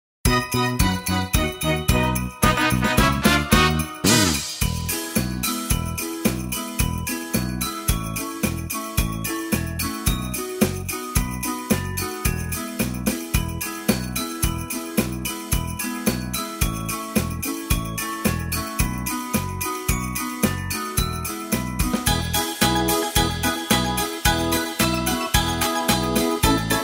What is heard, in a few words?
Jingle Bells Instrumental